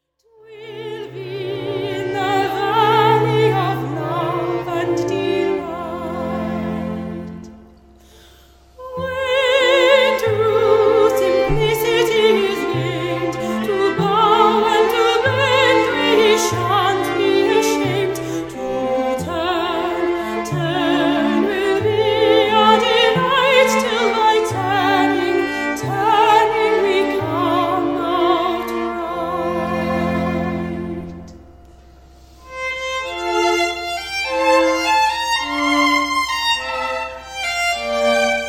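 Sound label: String Quartet With Soprano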